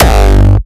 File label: Spaceship G 150BPM